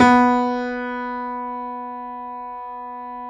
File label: JAZZ, Keyboards Acoustic; AKAI; USB Soundscan vol.17